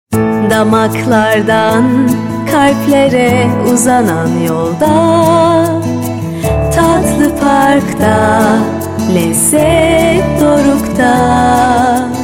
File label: jingle; tatlipark